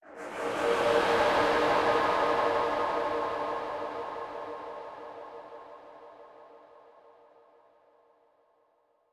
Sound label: dark